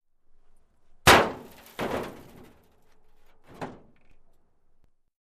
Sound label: Fell off the Roof Sound